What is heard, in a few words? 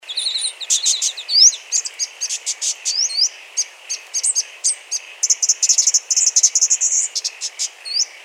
brown thornbill chorus